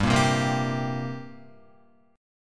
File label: G-Minor Double Flat 5th G down Guitar; strummed; JGuitar